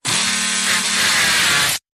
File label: Power Saw